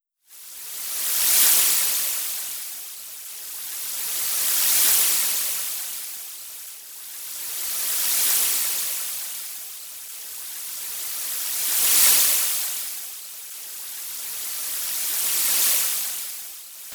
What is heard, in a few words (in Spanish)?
ruido